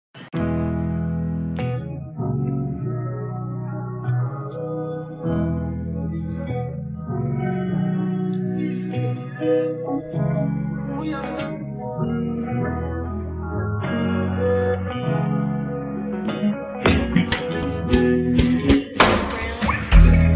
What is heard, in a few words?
instrumental